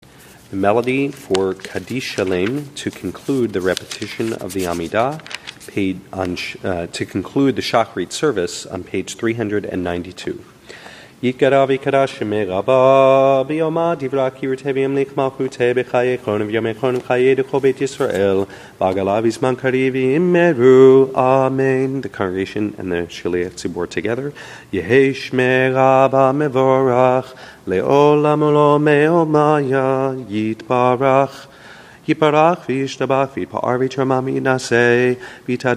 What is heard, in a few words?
kaddish shalem, shabbat tunes; Shabbat Shacharit